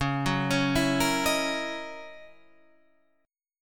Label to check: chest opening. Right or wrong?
Wrong